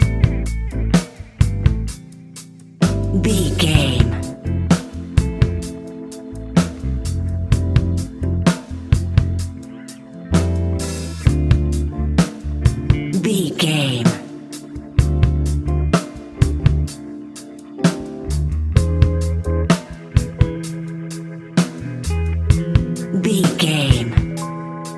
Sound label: neon city groove, A classic piece of Hip Hop and Rap music